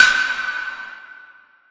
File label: wood percussion verby hi; Western and Latin Percussion; single hits